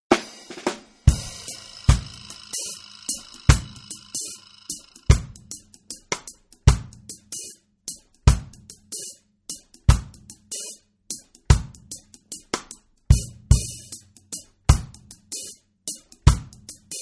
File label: drums